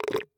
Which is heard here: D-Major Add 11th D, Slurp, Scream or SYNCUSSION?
Slurp